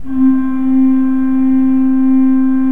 C3, Cathedral Organ, KOPPELFLUT; Propeller Island

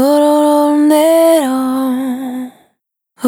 Vapor Dry, Single Voice Melodic Loops

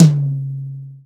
XV Tom Hi, Roland XV-5080

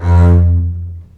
String Collection, MARCATO; F2; East West - The Ultimate String Collection

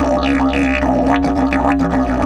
108, Didgeridoo

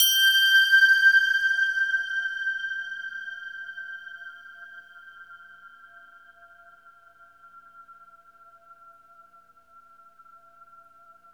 CARILLON; Distored Reality

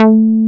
TEK A4, TEK BASS; Drums In Your FACE!

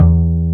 W BASS E1, Bass Guitar; AKAI S6000 CD-ROM - Volume 1

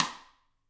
GRETSCH SNRR, Drums

